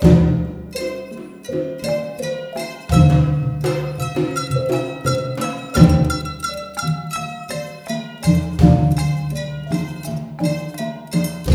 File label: MALAY L, Heart of Asia; Spectrasonics - Heart of Asia CD2